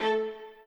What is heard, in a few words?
strings